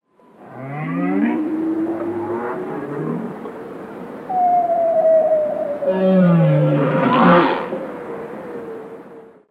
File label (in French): Baleine boreale; baleines et dauphins